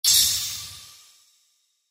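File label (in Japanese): 氷魔法 中, 魔法効果音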